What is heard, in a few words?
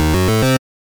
nazo, gameboy